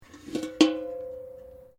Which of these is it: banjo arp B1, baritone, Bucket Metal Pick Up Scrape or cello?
Bucket Metal Pick Up Scrape